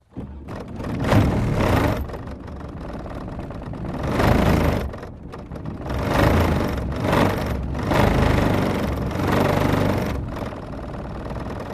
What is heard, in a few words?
Car; Start, Idle With Very Heavy Rattles, Off, Interior Perspective